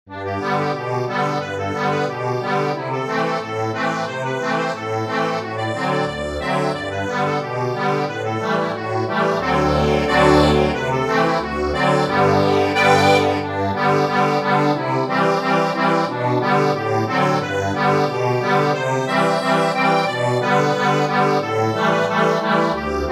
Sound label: accordion, sheet music; The Flappers